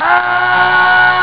cry; mango; ST-XX Amiga Soundtracker Sample Packs

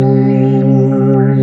SYN K-2000, Roland

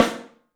MARCH, 3D Drums; 3D Sound; MARCH XTREM

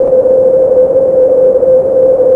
TUNED NOISE; Rubber Chicken Software Sample Bank; DEMOS

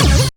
GLIDE, Synthy Tonal; Distored Reality

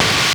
FIRE SNR, Drums; Distored Reality 1&2

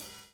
DOWN, DOWN KIT 78

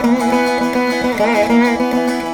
VEENA; Spectrasonics; Heart of Asia

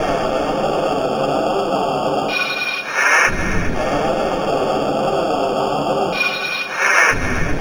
Industrious Loop; SFX & One-Shots

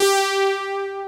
POLY KEYS, JV 1080; Pro-Rec - Synthsonic

